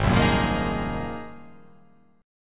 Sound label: Ab 13th Ab down, guitar, Ab13 chord; strummed; JGuitar